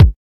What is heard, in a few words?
subtle reverb kickdrum sound g sharp key